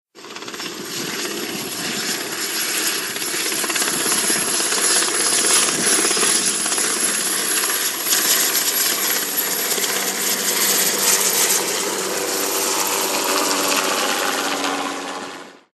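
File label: Heli Traffic Hover Away, helicopter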